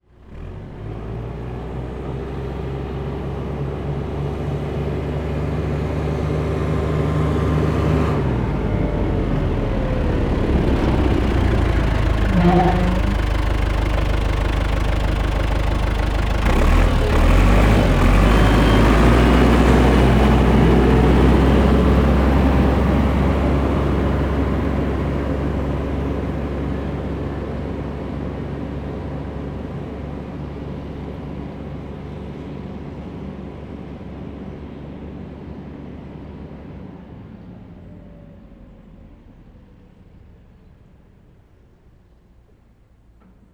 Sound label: excavator MF 860 exterior engine braking sound stop and drive away with gas pressing mono; SoundHolder; Cars In Motion